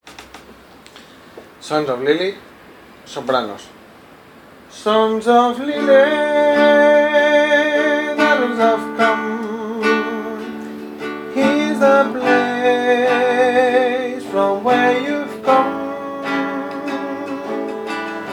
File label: soprano